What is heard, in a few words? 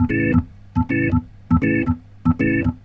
Roots Reggae, B-3; Sounds Good - Roots Reggae